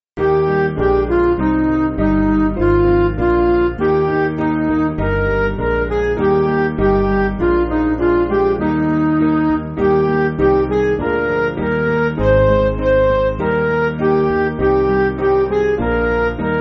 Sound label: Midi